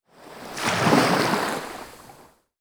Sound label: Ocean wave